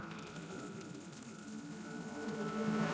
coin ding; sound effects

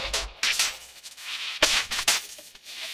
air horn

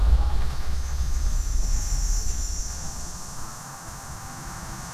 wind whoosh

